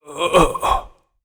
'uoghh..'

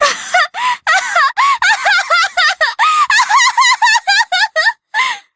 cackle; erika

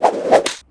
stick roll; vortex

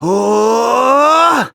wooaahh

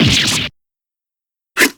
damage visor

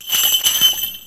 jingle bells

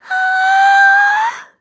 squeal

